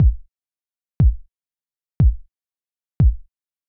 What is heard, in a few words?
Kick Hard; hydrogen